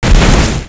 ATTACK

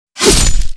ATTACK

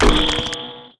MUSIC STONE

